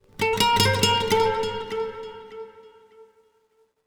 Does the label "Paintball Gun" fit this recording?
No